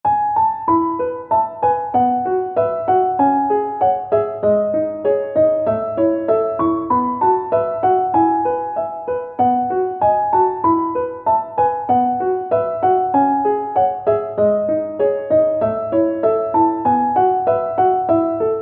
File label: piano